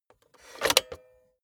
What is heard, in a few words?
cassette player slide tray insert soft